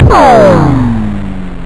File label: ric, debris